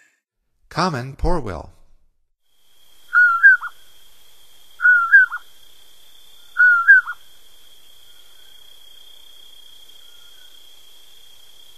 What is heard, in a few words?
Common Poorwill, Songs of Birds of North America; Stokes